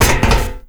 land metal, FootStep; WarRock

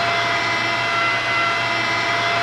RAM Horizontal Move; WarRock

